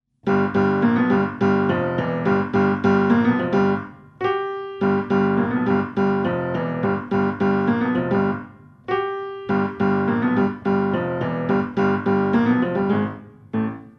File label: Piano; c64; Thing on a Spring